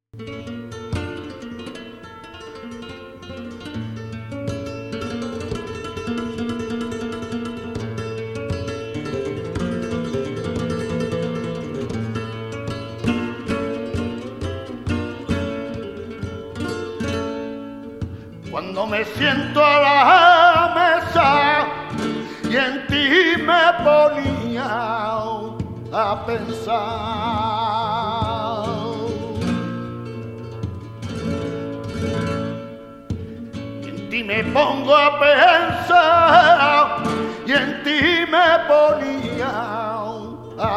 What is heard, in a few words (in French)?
soleá de Noriega, chant, guitare; "Cuando me siento a la mesa..."